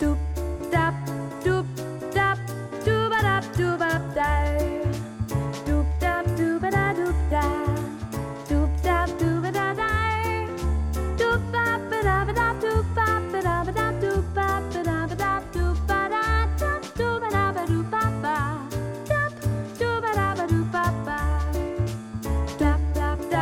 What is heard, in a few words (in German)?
Monday Kanon vokal